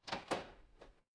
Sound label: door lock